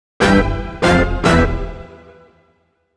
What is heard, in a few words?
Grand Stab; Sonic Foundry (Sony Creative Software); Club Joints and Street Anthems